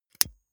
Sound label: lighter electric switch flame on gas